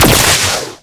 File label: SFX Shoot; Ninjini